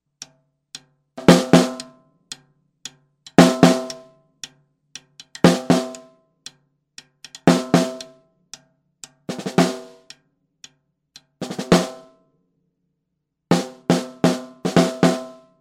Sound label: top bot snare